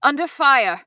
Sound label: Taking Damage; Realm Royale; Hunter; Realm Ops